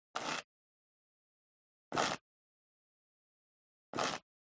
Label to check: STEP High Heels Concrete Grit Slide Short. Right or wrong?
Right